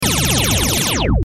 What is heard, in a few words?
lazer